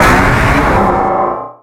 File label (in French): Cri Méga X; XY